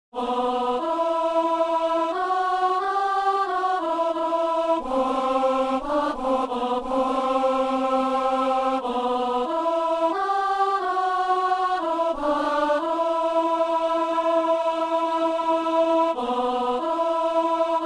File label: soprano; Messe du 17 janvier à Saint-Denis